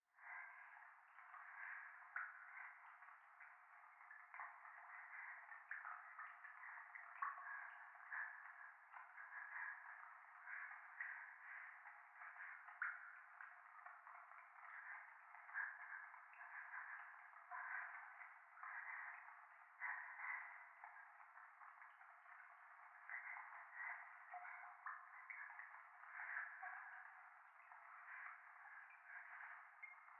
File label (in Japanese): 教会 右耳快感吐息 雨上がり